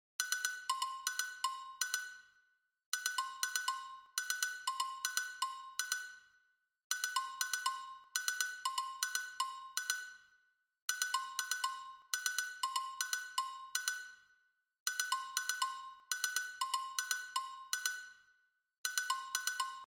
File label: agogo